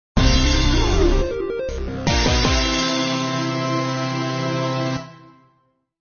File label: Game Win; Super Adventure Pals